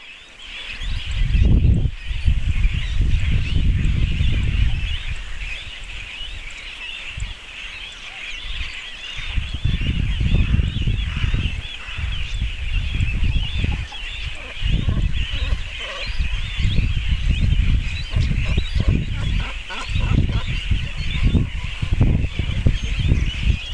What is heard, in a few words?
Ph carbo chicks and adult at colony